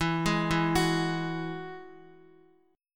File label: E Major Add 9th, Guitar; arpeggiated; 4F,4A,5C,5G,5C,6E